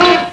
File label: Comedy Horn Beep